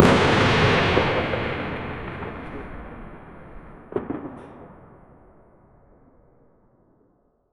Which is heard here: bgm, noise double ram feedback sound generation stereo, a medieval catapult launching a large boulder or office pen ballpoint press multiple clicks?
a medieval catapult launching a large boulder